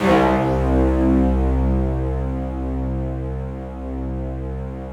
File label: SQUEAK C1, Yamaha VP-1; Music Store